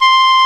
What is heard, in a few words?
flute, Akai S-1000, C3; Best Service ProSamples vol.55 - Retro Sampler